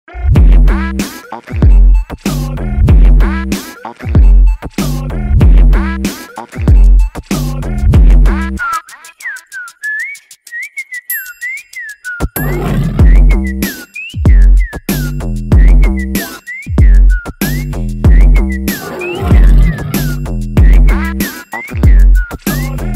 Ad Ringtone; Kia Motors

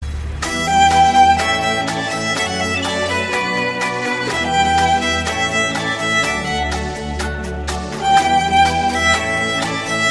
Violin Ringtone

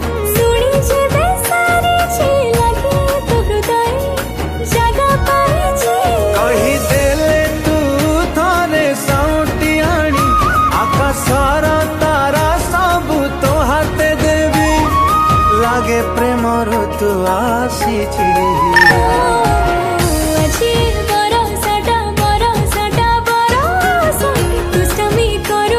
Odia Ringtone

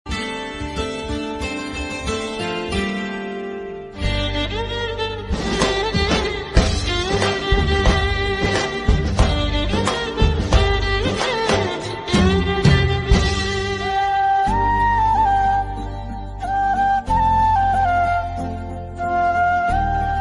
Instrumental Song

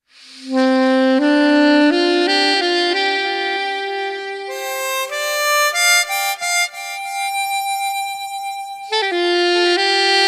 Piano Instrumental Ringtone

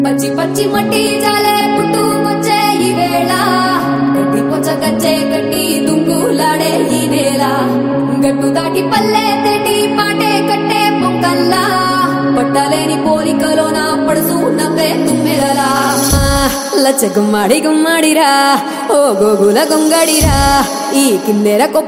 Birthday Song Ringtone; Keerthy Suresh

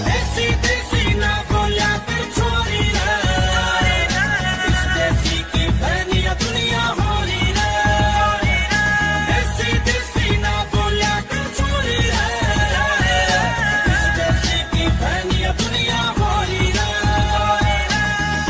Haryanvi Song Ringtone

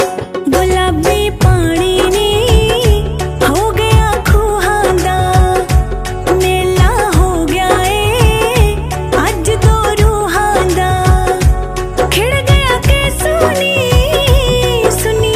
Song

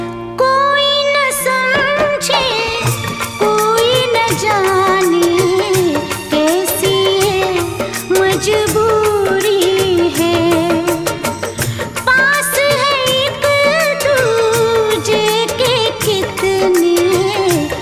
Sad Hindi Song

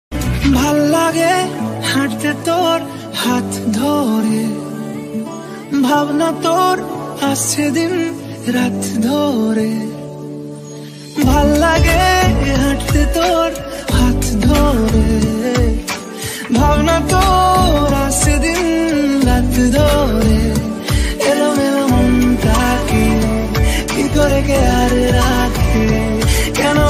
Bangla Romantic Ringtone

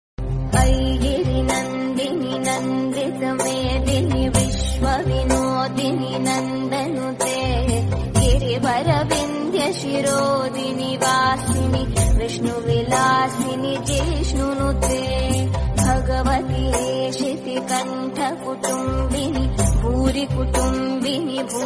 Sanskrit Devotional Song Ringtone

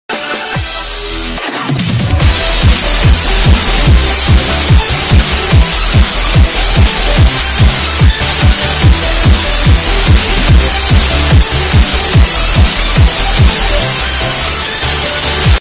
Loud Ringtone For Hard of Hearing; ringtones

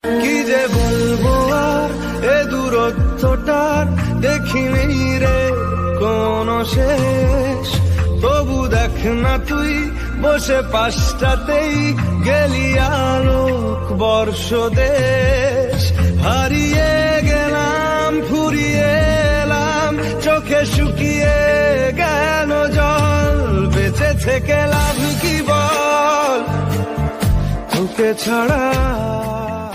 Love Bengali Song

